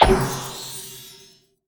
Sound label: UI Advisor Collected Slam; Taps to Riches; Android